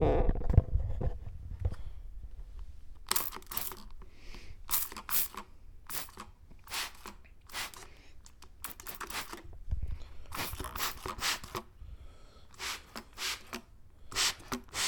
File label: Spray Bottle